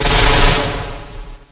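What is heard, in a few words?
weapon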